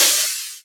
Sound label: Open HH, Cymbals